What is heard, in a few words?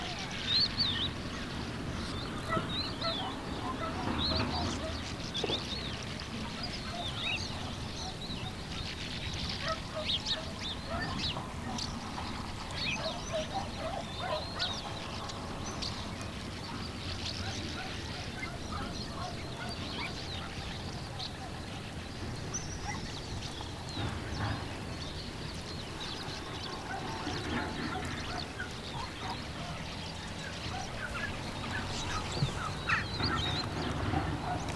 Ambiance Marshes